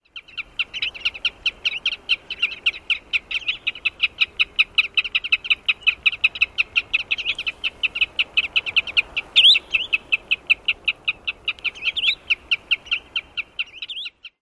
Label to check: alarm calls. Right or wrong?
Right